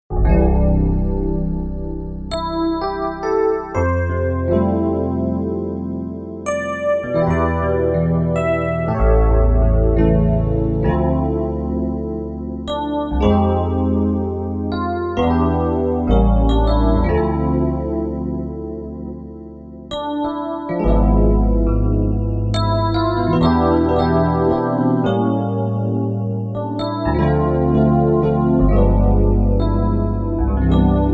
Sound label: EP+Exp Pad, Roland JV-880; Factory Patches